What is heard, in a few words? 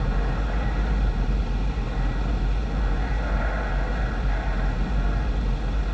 Ambience Loop, outdoor